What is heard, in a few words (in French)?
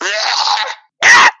gémissement